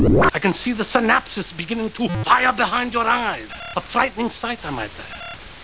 STATIC; Babylon 5; Londo

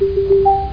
default beep

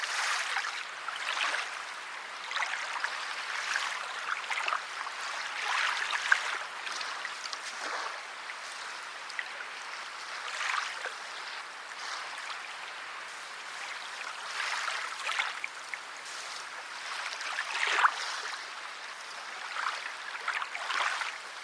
garden Pond; Keepsake

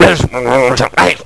other shoot; Hearts Deluxe; zake other shoot

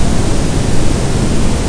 water on; Panic Volcano

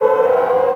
fiend; kult

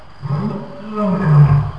lion; onsafari

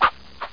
SLASHM; THE REALM

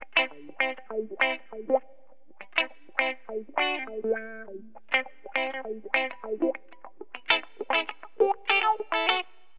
WAH, Guitar; LiveAct Pool; AcidJazz Funk

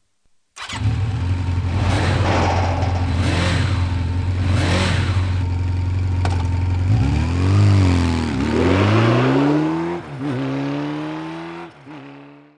CUT; kingpin; cut scenes

